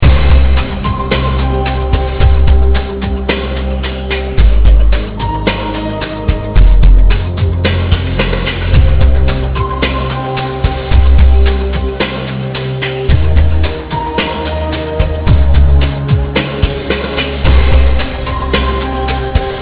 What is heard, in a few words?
decay, ambience; xash3d fwgs; induction